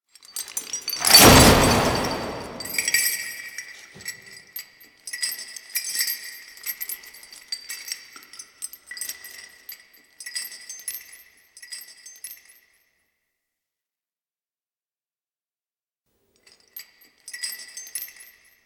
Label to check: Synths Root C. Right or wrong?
Wrong